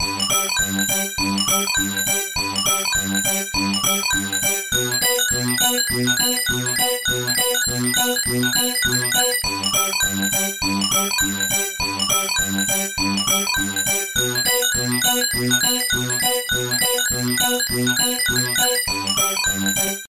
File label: game ringtone; BUBBLE BOBBLE